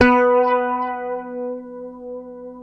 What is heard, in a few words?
Fingered bass B3 R; Sampleheads; Will Lee Bass Library